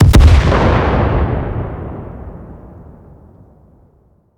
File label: combat atmosphere; World of Tanks